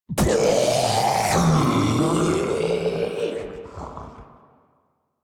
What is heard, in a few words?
Death; Grineer Ghoul Devourer